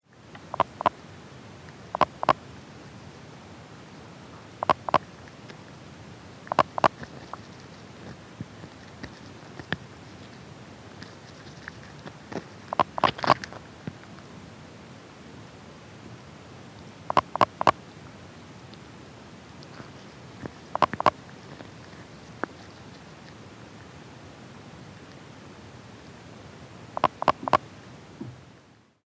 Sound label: Pelobates fuscus